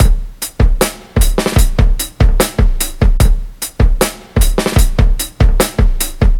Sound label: 75 bpm drum loop sample c sharp key